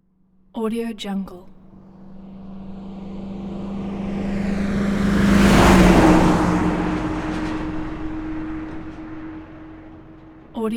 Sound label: peterbilt semi truck fast by; hollywoodedge